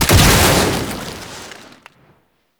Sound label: Arcane Melee Shockwave Radial Blast; Warframe; Mods; Proc